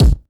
shiny kick one shot g sharp key

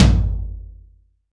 roomy tom sample g sharp key

